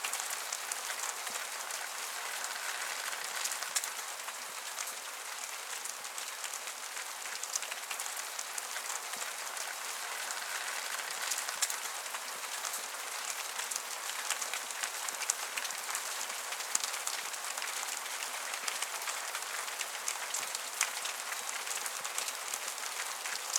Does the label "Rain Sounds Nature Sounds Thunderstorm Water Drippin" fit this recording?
Yes